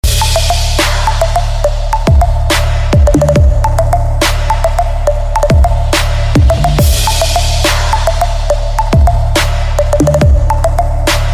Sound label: trap